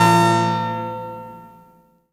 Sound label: HOE DOWN, SYNTH; Keith Emerson Signature Series